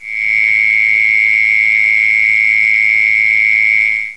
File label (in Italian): natura nature